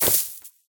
equip chain, armor; item; Minecraft; Conquest Default

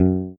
bass attack, note; Minecraft; Conquest Default

